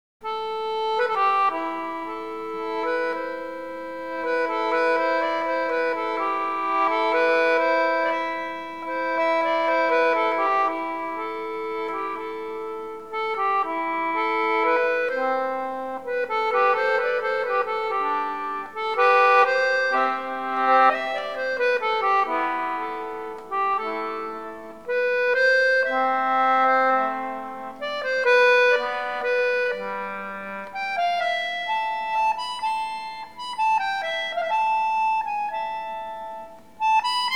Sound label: concertina; The Wounded Hussar (aka Captain O'Kane); Played in the standard treble range (on my tenor-treble); English concertina